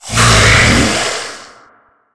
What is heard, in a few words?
spider ouch strong